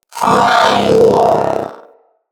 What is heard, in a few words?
Companion Vocal Alert, Pets; Zanuka